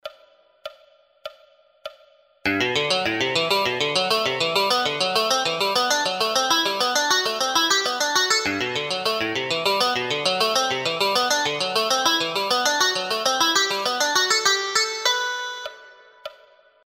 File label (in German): SCALES Vierklaenge